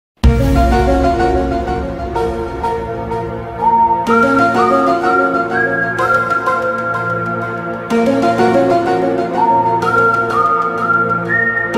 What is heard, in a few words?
MLG Sound Effects; ILLUMINATI CONFIRMED